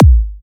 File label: Electro Kicks; Vengeance - Essential Clubsounds Vol.5; Bassdrums